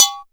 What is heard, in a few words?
BELL, PERCUS KIT; AKAI